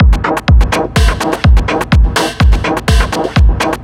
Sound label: 125, Drum Loops; GetWonky; M8; Electronisounds